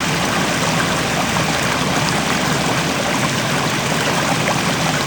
Babbling Brook, gnarled forest

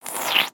Frog Hat Slurp; creatures organic; Ribbit Frog

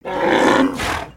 Pet Buffalo Happy; creatures pets; Lego

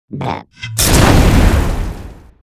Fire Blech; emotes

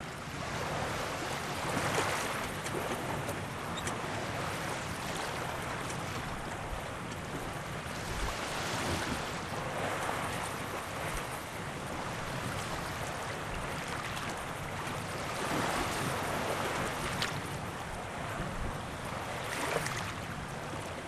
Ocean; ambience

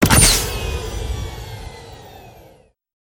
npc jet pack; avant gardens

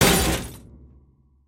collide, vehicles; aronwk collection; ndaudio

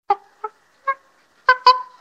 Pistol Monkey Chirp; gnarled forest

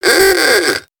carhorn

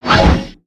Sorcerer Slash; Valiant; aronwk collection; ndaudio

